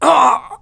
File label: pain